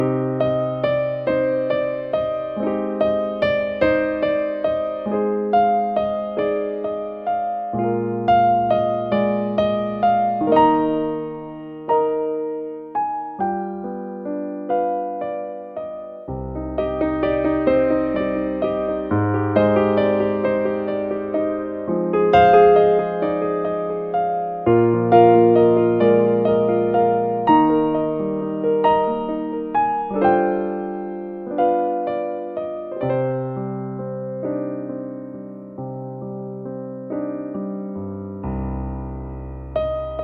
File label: Piano